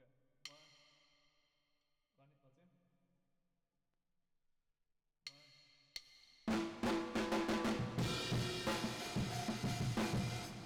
Drums Verb

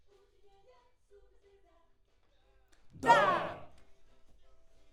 Schoeps AKG, piano

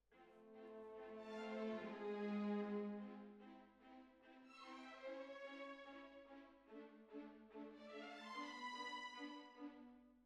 Cbasses

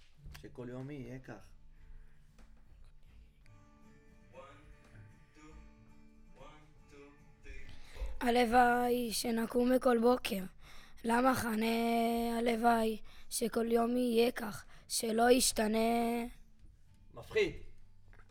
VOC

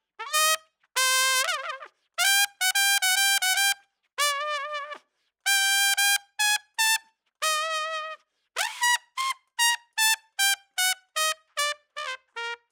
Trump; RE20

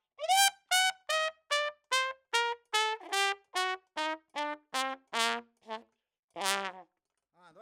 Trump

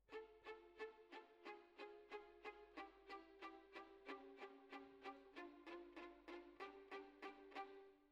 Violins Over

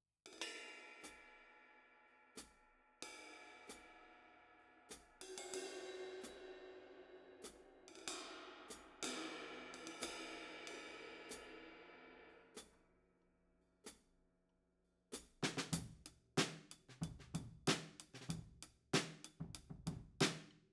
Overheads, 94bpm

